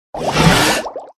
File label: hydra attack voice up